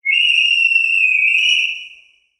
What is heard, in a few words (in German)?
Schaffner Pfeiferl